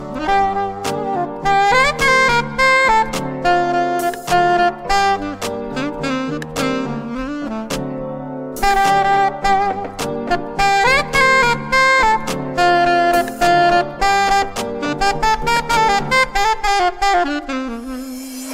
saxophone

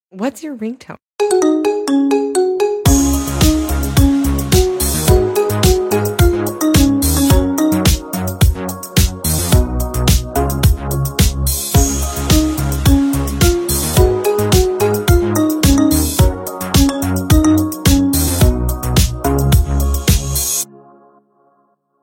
my treasure marimba

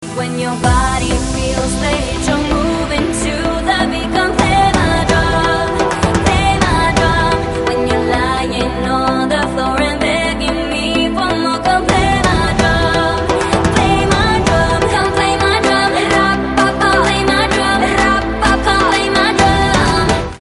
drum

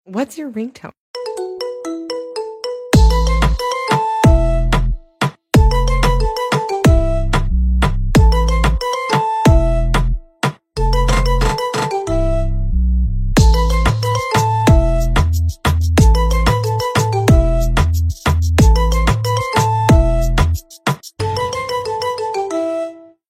iphone 12 pro

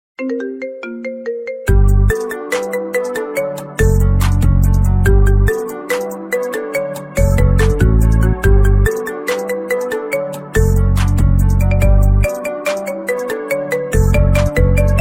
second emotion marimba

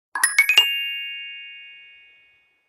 nokia asha 503 stock notification

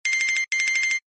cubicle sms

